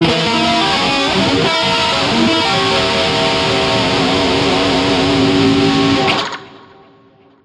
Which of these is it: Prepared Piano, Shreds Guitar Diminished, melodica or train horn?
Shreds Guitar Diminished